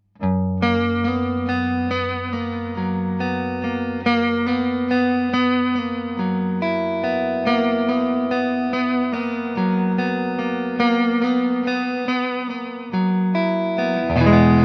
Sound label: delay